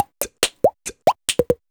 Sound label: Beatbox Loops, Human Beatbox